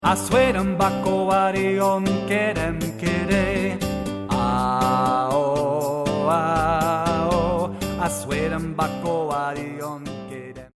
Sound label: congo boat song